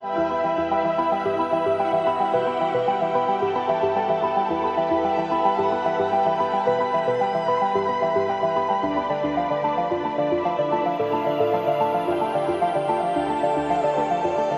relax synthesizer

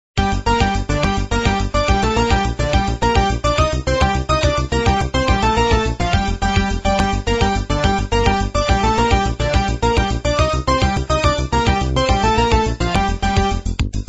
iphone x galaxee music

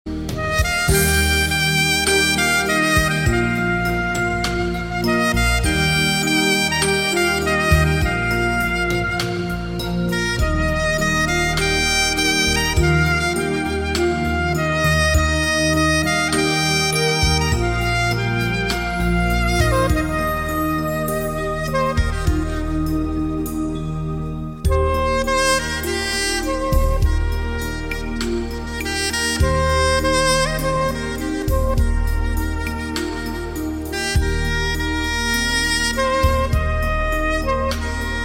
jasmine flower saxaphone

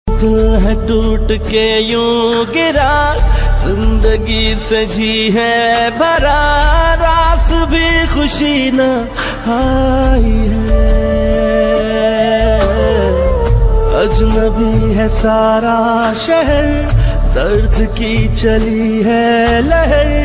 sad ringtone

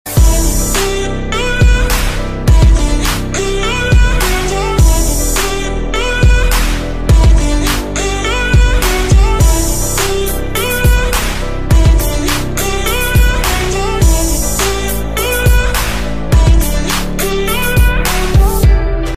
instrumental

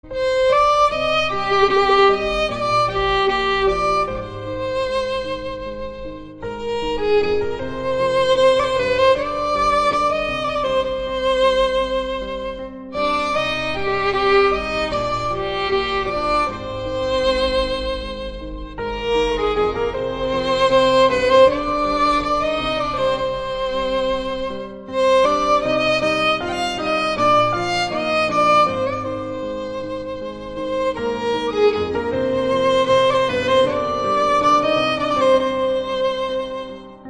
lullaby violin

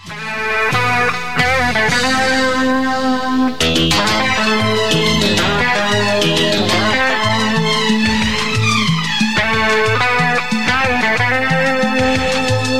guitar ringtones

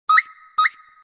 birds ringtones whistling bird

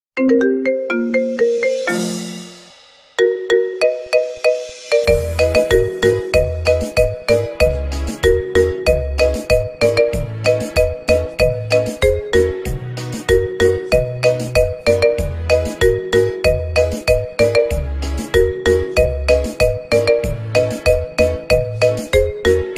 marimba remix

